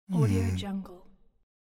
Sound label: hmm